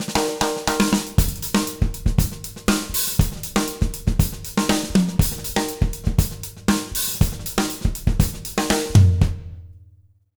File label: 120 JROCK, Drumloops; Jazz Latin; USB Soundscan vol.08; AKAI